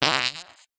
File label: cartoon fart